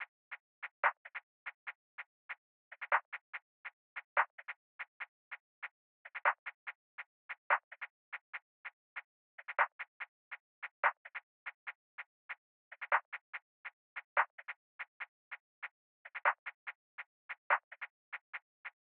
144 BPM E Min Percussion, Drill; Cymatics; PARADOX II; Melody Collection; Casket

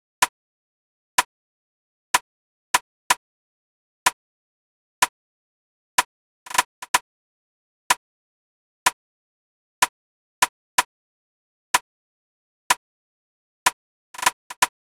Drum Loop - 125 BPM Rimshot; Cymatics; Antidote Drum Loop

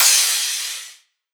Dubstep Crash; Drums; One Shots; Cymbals; Crashes

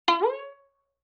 Violin Pluck Pitch Rise; Vintage Cartoon; Musical Elements

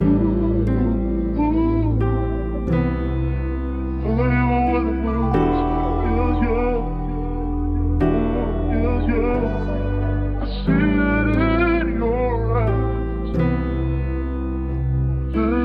90 BPM E Min, Melody Loops; Cymatics; Orchid Sample Pack

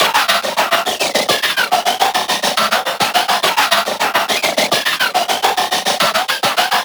FX 140 Silder; Riddim; One-Shots; SFX; Ghosthack; Riddim Essentials Volume 2

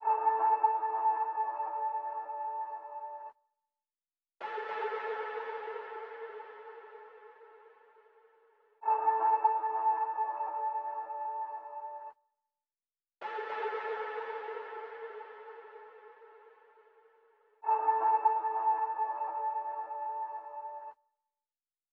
109 BPM D Min Accents, afrobeats; Melodies; Loop Stems; Cymatics; GHOST (Beta Pack); Bembe

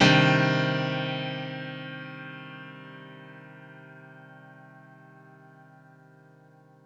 One Shot Piano 140 BPM (C Minor), Grand Piano; Instruments; One Shots; Ghosthack; Guitar And Piano Samples

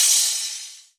Crash, cymbals; Cymatics; EDM Starter Pack; Drums - One Shots

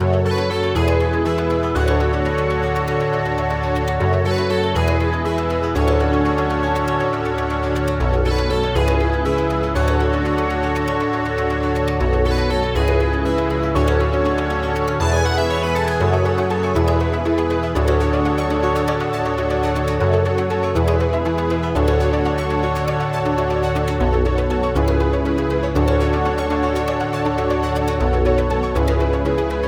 120 BPM F# Min, Melody Loops, Retro Collection; Escalade; Cymatics; ANALOG EVOLUTION Beta

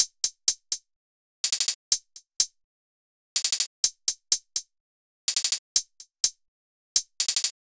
Hihat Loop, 125 BPM, Hip Hop; Drums; Humble Hip Hop Sample Pack

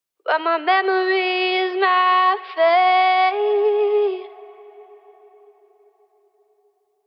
Phrase Wet 79 BPM C# Min, Vocals; Memories Fade; Cymatics - Eternity Sample Pack; Phrases

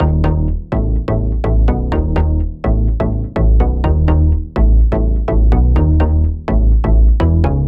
Bass - Em 125Bpm, Techno; Bass Loops; Noughties; Ghosthack Advent Calendar - Day 6 - Techno Kit

